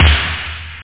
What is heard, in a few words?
snare; snare samples